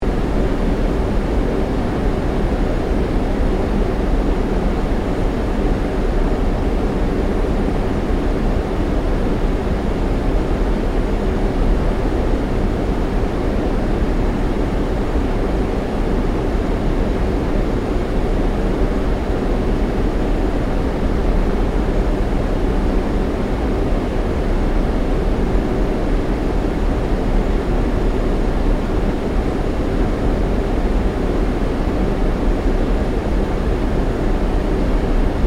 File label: Ambience, Idle city train